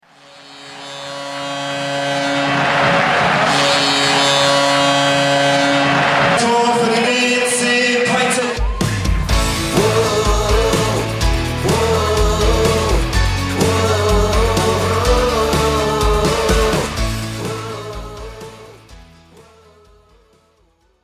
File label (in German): hymne